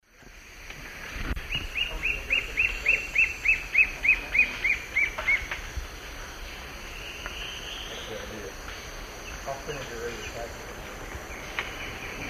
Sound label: Myrmotherula axillaris, song; S. America; Eastern Lowlands